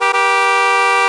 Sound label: whistle; President